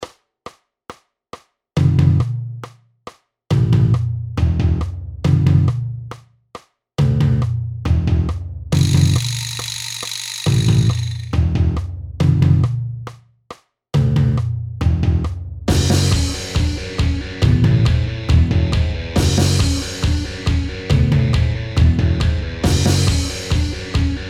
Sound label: Bass Drum Line